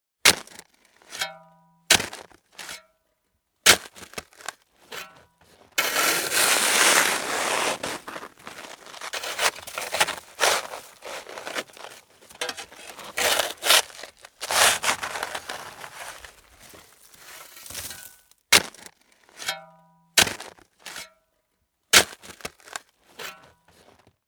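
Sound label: snow being cleared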